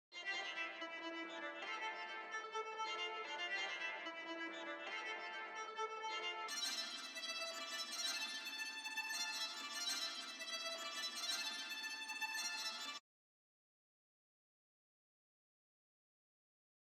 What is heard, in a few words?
148 BPM D Min Tremolo Violin; Loop Stems; Cymatics; Audemars